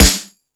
Sluggo Drums, Drum Machines

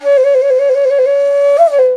INDIA ALTO

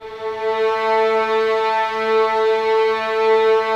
violins A 3, string ensembles; Miroslav Vitous

